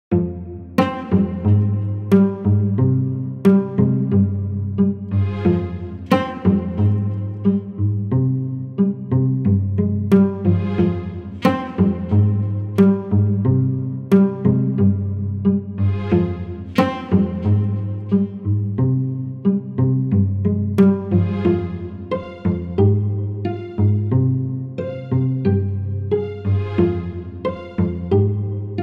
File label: Waves 90bpm lofi